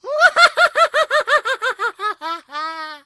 risada da vovo

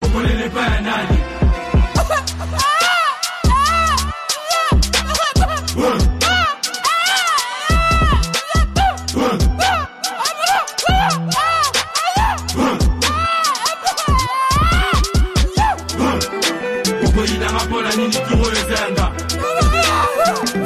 rap

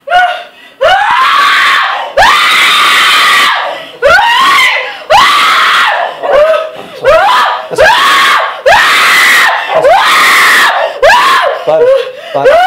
grito de crise

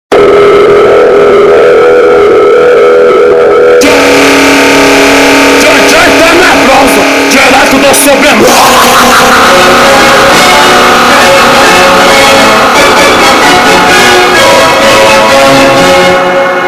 montagem orquestra intro

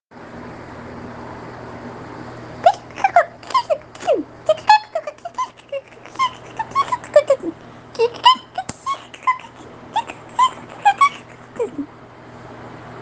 calopsita xingadeira

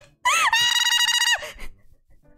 grito

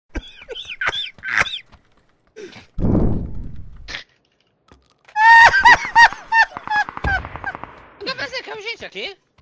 risada fofo

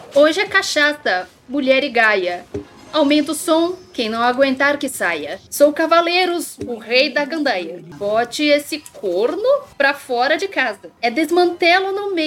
forro

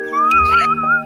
chaleira

